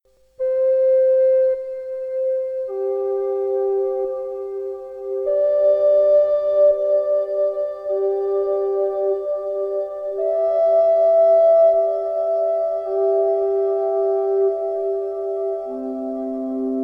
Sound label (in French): reverb longue